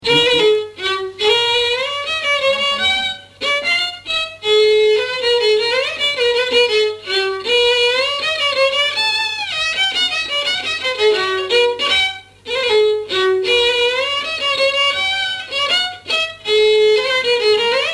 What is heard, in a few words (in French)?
scottish, violon